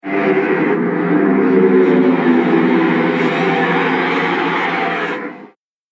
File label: Mortal Engines, spacecraft sound effect; Fantasy Sound Effect